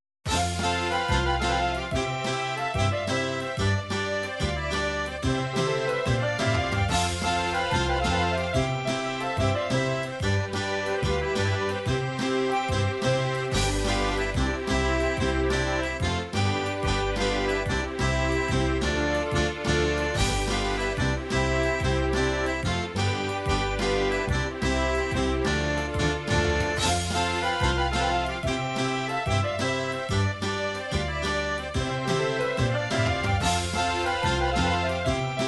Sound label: folk bulgaria horo paidusk, midi